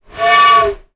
sound design effect air pipe pressure

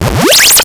sound design computer glitch

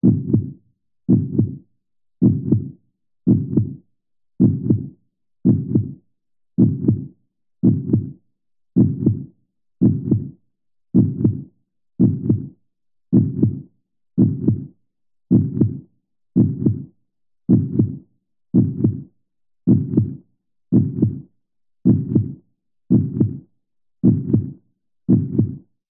Heartbeat

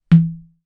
sport tennis ball hit window

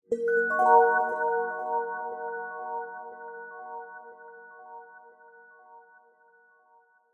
multimedia alert calm zen mellow soothing

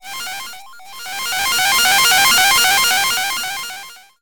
science fiction data transmision radio burst

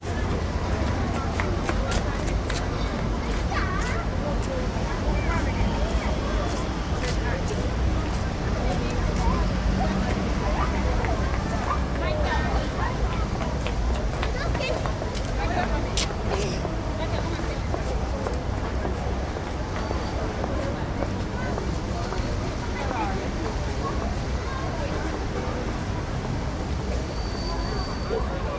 ambience london trafalgar square people children

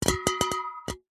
soda cans tins empty bash together

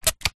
household water spray bottle empty spray single

